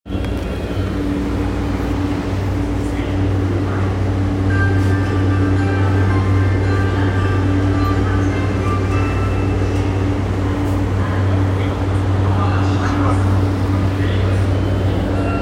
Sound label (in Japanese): 半蔵門線水天宮前西